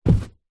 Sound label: footstep single carpet wood floor boots walk; foley; zapsplat